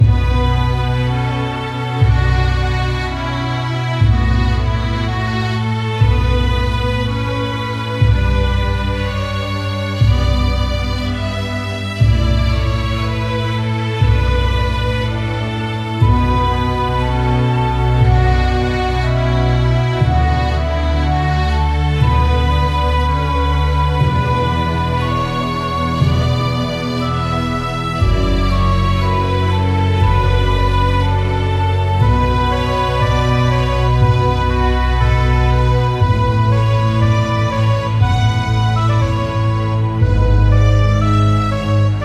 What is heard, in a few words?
hymn epic